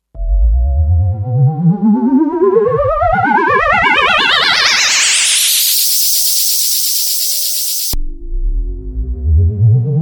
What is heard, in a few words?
UFO, Sequential Circuits Six-Trak; Factory Programs